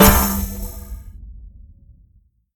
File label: menu confirm; tetrio